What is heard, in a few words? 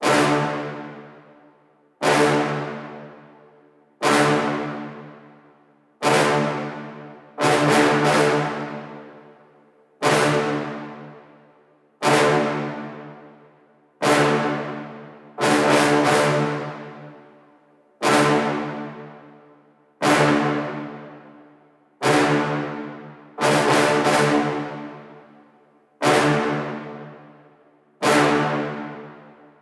Low Brass - Am 120BPM; Construction Kits; STEMS; Ghosthack; SkyRealm; Kit 21 - Mystical Journey